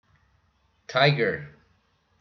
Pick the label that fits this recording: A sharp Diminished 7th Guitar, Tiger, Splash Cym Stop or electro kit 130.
Tiger